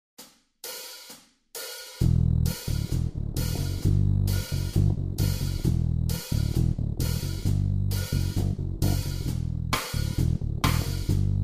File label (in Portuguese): instrumental